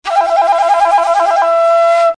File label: trilly flute; MELODIC SAMPLES